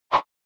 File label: SNES Fighting Swing